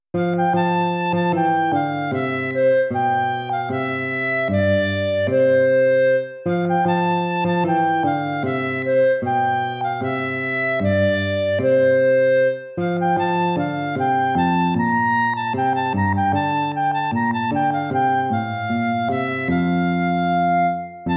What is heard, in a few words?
bransle gentil; praetorius michael